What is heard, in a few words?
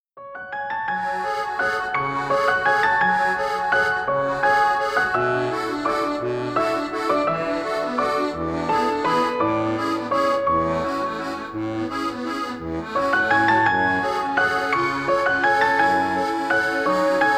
Ballad of Luska